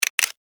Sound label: Insect, Claw, Dry, Snap, Movement, Bug; Sound Effects:Animals:Insects; Insect Claws; Blastwave